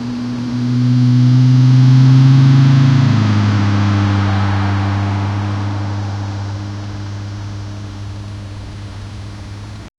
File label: a car approaching slowly